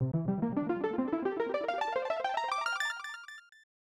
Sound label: Unknown Jingle; Wedding Peach; SNES